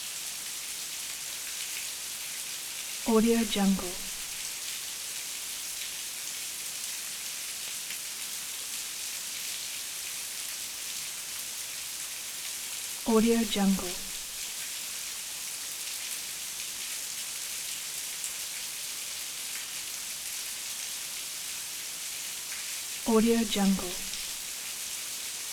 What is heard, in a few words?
heavy rain